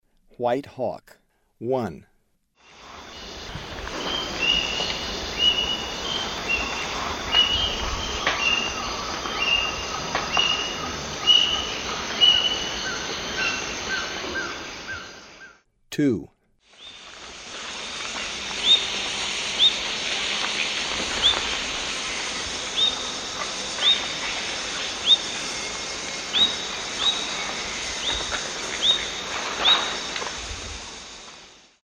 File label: White Hawk; S. America; Eastern Lowlands